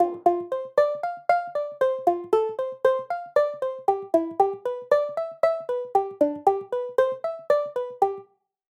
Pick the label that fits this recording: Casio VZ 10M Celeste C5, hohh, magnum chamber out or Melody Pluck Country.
Melody Pluck Country